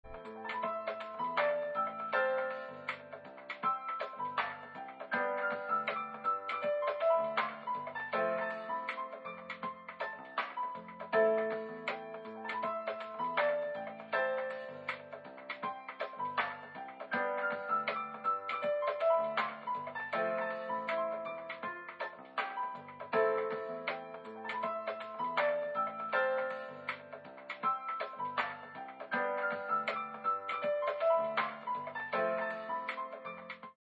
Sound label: Elevator remix; Wakfu S2 Ep 4